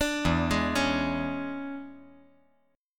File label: E 13th